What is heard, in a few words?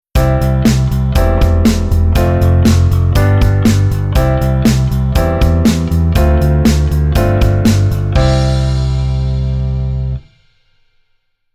Bass Arr Root Nomal